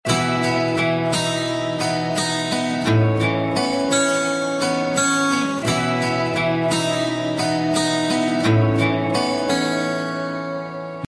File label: guitar love tone; Electronica